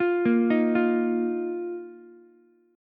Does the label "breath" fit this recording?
No